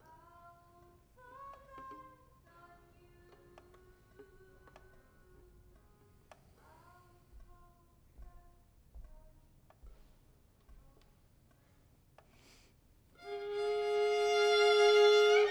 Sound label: violin